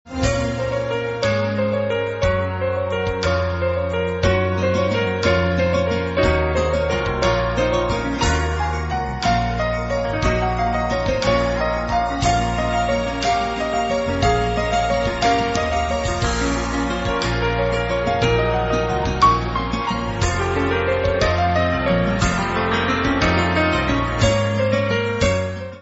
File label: Carol Bells; Merry Christmas